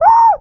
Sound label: moo, tuba